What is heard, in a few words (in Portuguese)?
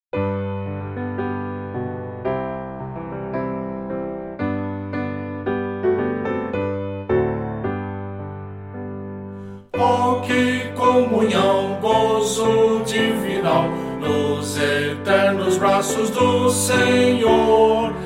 voz piano